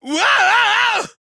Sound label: whaaa; monokid